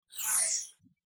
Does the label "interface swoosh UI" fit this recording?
Yes